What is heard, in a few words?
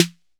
SNAP SNARE; RAP CITY 1